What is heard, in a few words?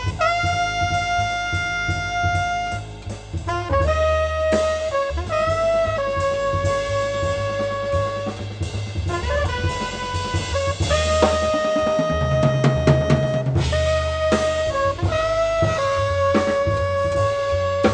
jazz